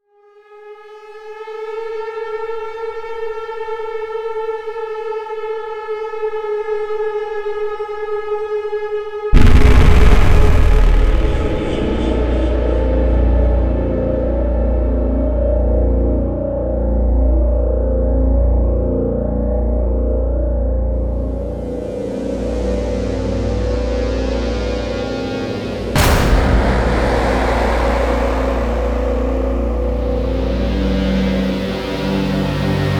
cinematic tension sound effects

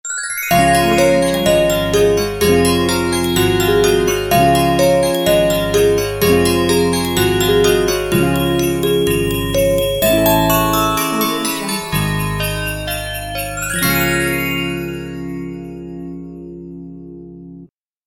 christmas logo

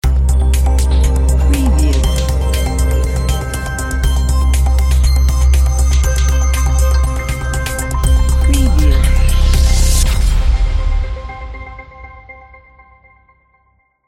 technology logo ident

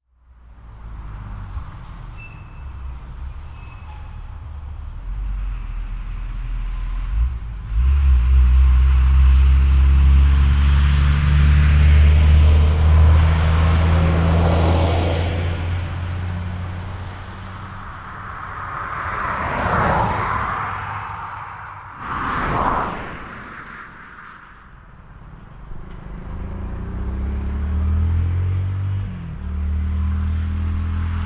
transportation sound fx

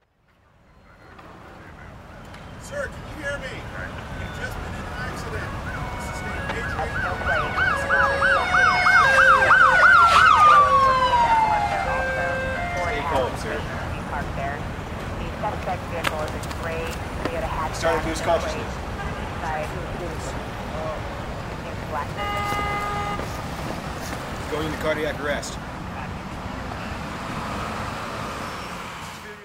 Sound Effects Police Sound Effects; daddykool

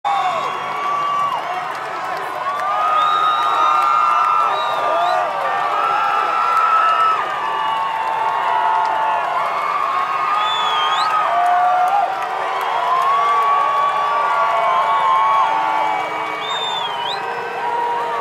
crowds and mobs

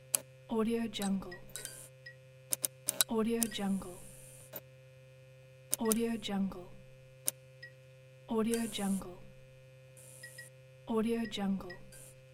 neon light flicker sound fx

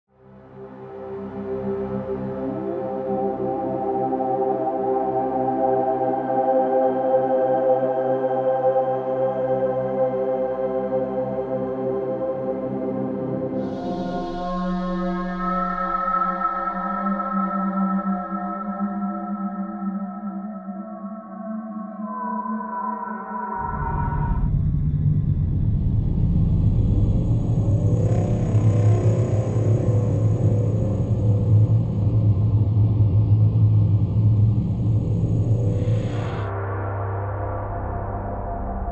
sci fi world sound effects

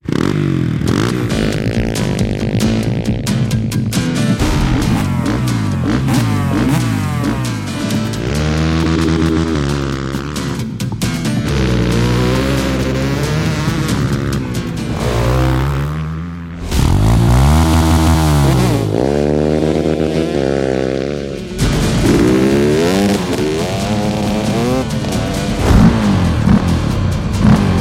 Dirt Bikes; Comprehensive Dirt Bike Sound Library; BOOM Library

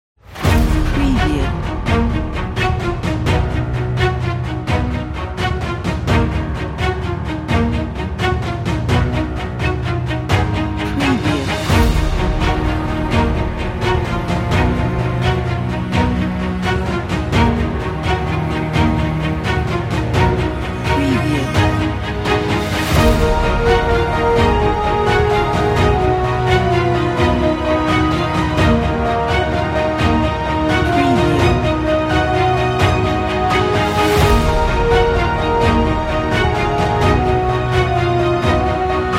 Cinematic Emotional; Motion Array

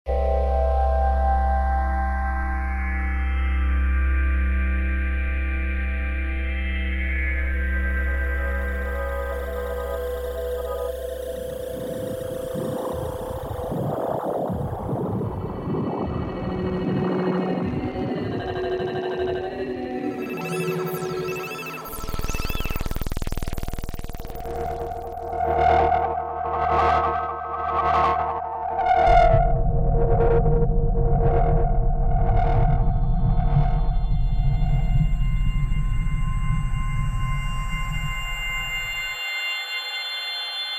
synthesized moods

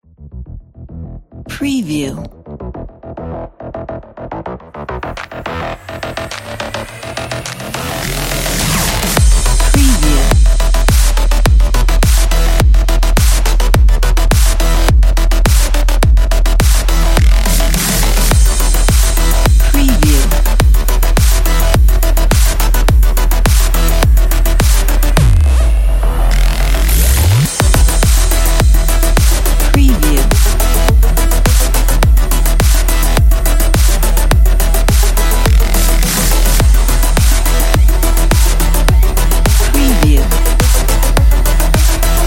sport action

